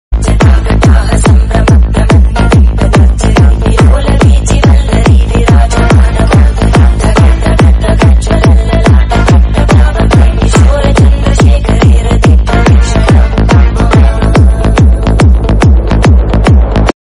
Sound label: flute; shiv tandav